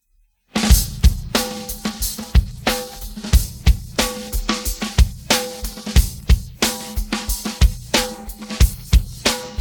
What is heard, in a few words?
pattern, drums